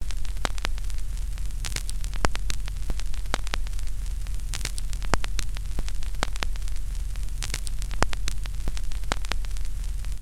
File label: vinyl